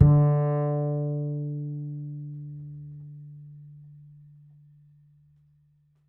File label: D string upright bass; Upright Acoustic Bass